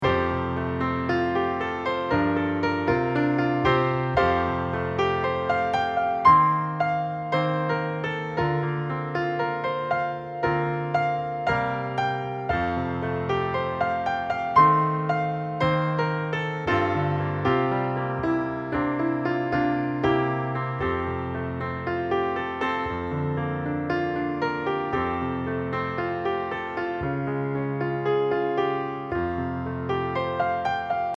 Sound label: accomp